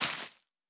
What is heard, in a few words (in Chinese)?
海绵 破坏声